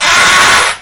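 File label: onara, fart; pupupu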